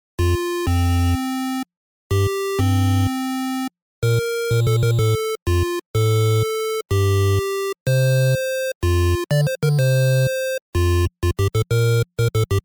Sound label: Optimistic Main Theme